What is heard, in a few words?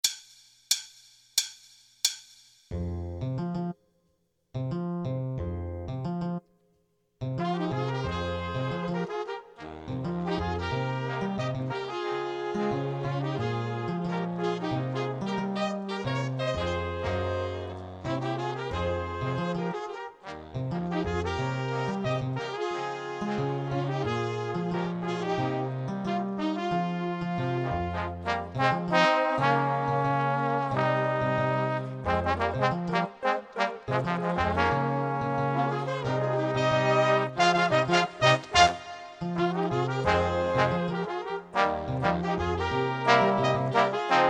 piano drums